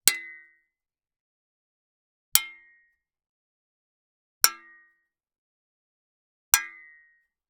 Spade - HIT - Drumstick - Ring - Mute; Pole Position; The Metal Hit Sweeteners Library

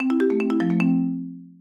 Marimba Gliss Down Fast; The Chris Alan; Gliss Bliss

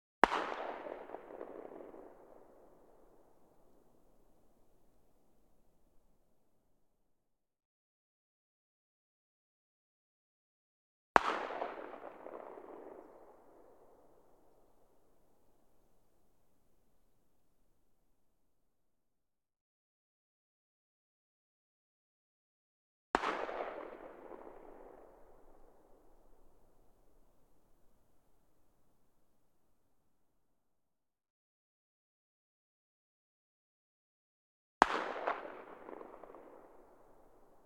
Colt Python 357 Magnum - FIRING - 160m Behind; ORTF; CM4; Pole Position

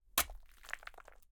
CHISEL SCRAPE DEBRIS, Ice

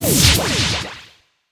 teleporting - sound, Retro Game SFX

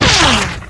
near miss, weapons

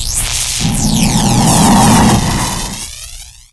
particle storm form; hl2

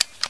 smg1 clip out; hl2

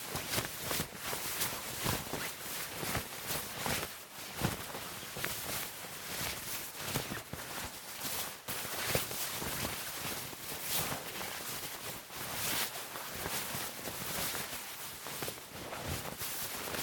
Moving Loops, Wind Breaker, Loopable; Badlands Sound

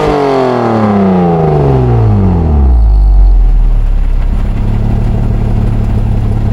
atv throttle off loop, vehicles; hl2

